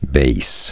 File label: bass